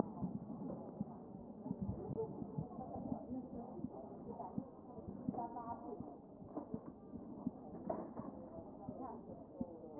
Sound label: chest